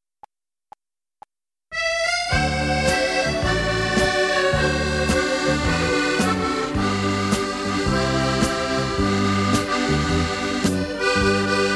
Accordion Medley, G

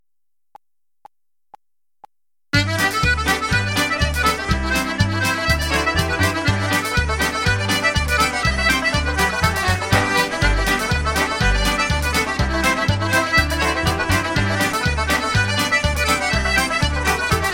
Traditional Irish Reel G; backing track

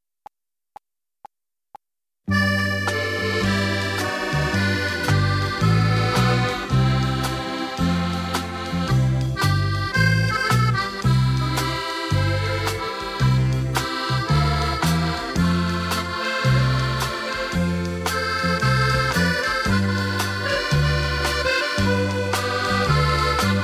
Accordion Medley C; backing track

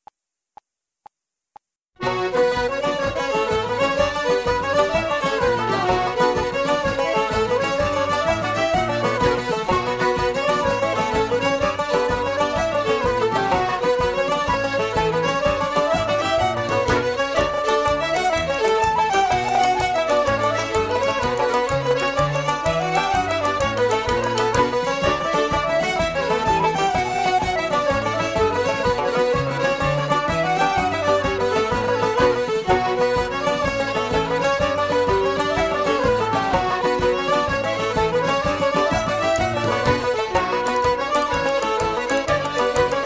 Traditional Irish Jigs Medley Em; backing track

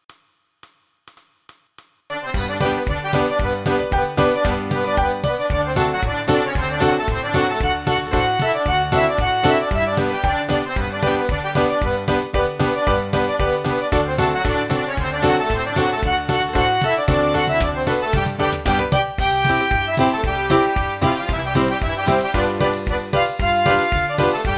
Traditional Irish Polka G; midi files; Clar Polka

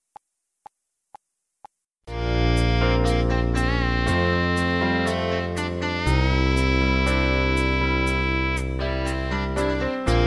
Second Violin Bagatelle C

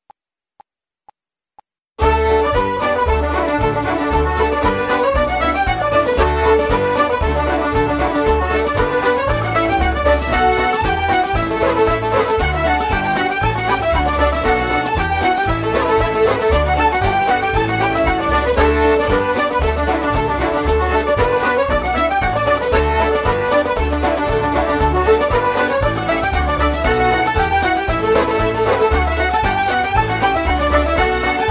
Irish Ceili Reels Medley D, backing track

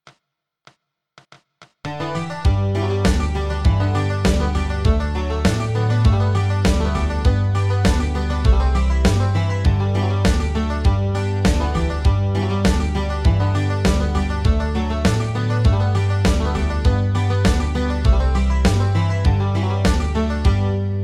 Bluegrass Banjo G; backing track

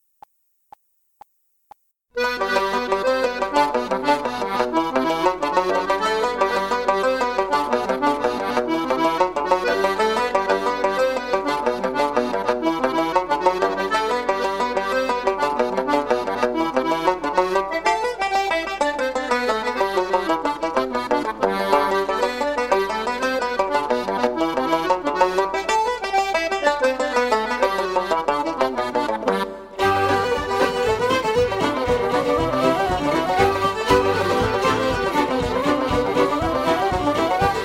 Traditional Irish Jigs Medley G; backing track

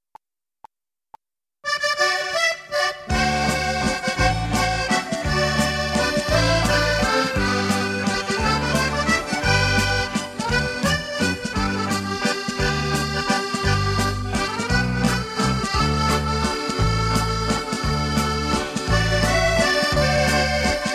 Accordion Medley G; backing track

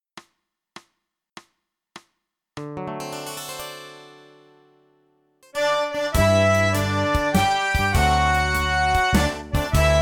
Party Song Happy Birthday; backing track

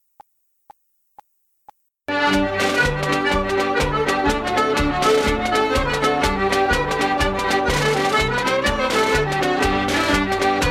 Irish Ceili Jigs Medley G, backing track

